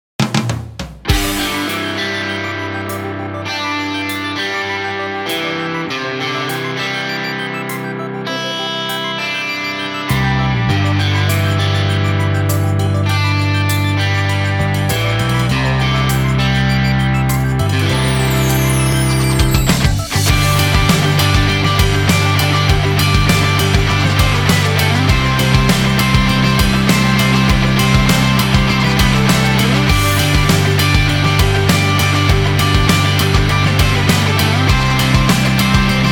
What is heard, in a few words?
BGM Country 100